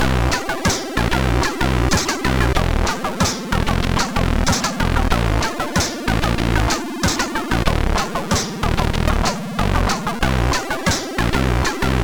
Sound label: menu, sid MOS6581R3; Fly Silence; Skeleton menu